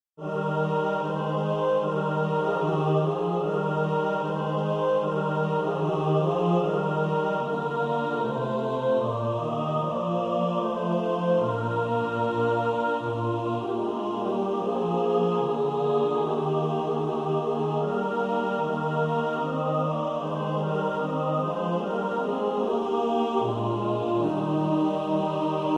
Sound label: mixed